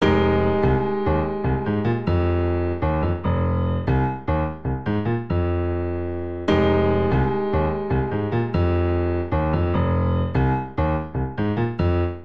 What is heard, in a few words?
Vamp Jazz Funk F Minor 74 bpm, Grand Piano; MIDI Clips; Ableton; Factory Packs